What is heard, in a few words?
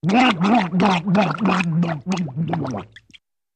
Funny Drowning Water